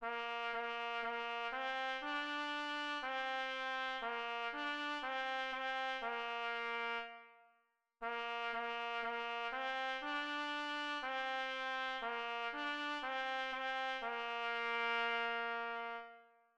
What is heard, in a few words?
au clair de la lune, trumpet